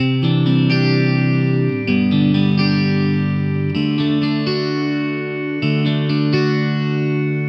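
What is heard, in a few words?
Electric Pop Guitar 128BPM G major; Vengeance - Essential Clubsounds Vol.4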